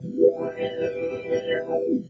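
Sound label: Pad Synth; Equipped Music; Loungin House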